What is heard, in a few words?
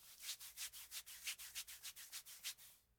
Applying Lotion on Skin; Fairlight Sound Lib